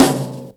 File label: Snares, Real